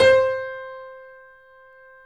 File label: 3D Pianos Boesendorfer; E-MU Producer Series Vol. 5; 3-D Audio Collection